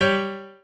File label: piano